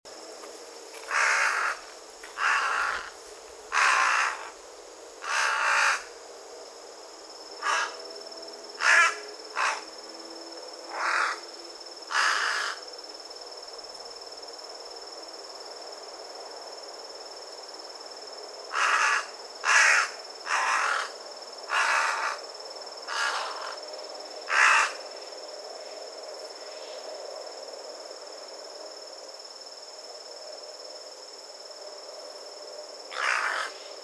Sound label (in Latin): Corvus corone; SETE